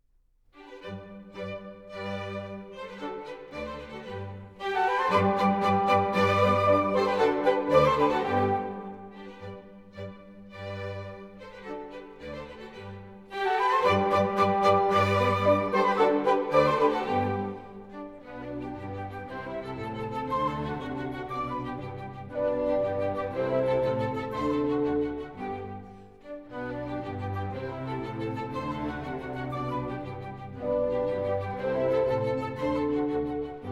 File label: Chamber Orchestra, Contredanse in G Major; Orpheus Chamber Orchestra; Mozart; K. 610 Les filles malicieuses